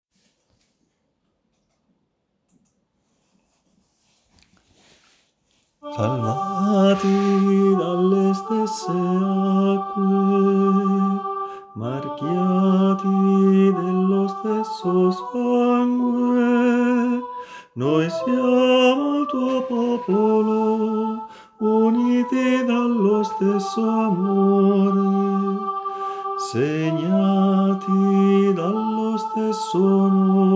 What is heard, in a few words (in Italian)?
Salvati Dalle Stesse Acque Tenore; coro diocesano